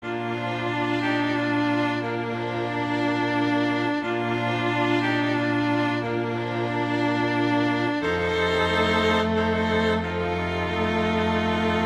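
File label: Silent Night, string quartet; Gruber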